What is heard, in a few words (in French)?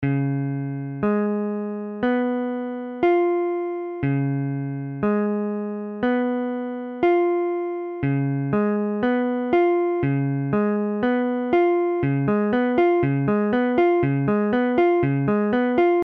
C dièse 7, guitare